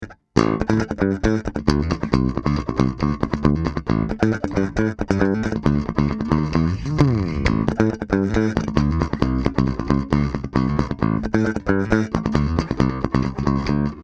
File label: Pick pm comp; HB Block 800b